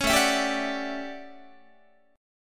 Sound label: A 13th A down Guitar; A13 strummed